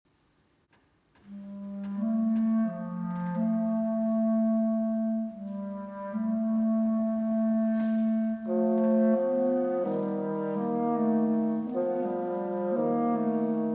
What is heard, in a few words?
Cello Concerto, Andante Poco Moderato, Live